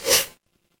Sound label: ui toast